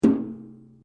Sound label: RUBBER ON METAL HOLLOW; Materials